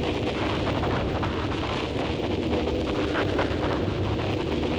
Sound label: Cracklature, sparse soundscape